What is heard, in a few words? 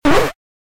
miss note; Funkin